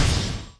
SUM DMG EFT

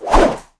Whoosh

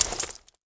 Cloth

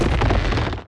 FOOT TREE

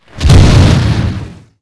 close

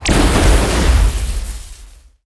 Elect Shot

